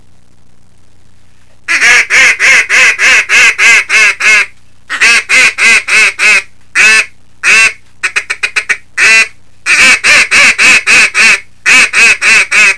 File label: Mallard Double reed & Whistle combo, duck sounds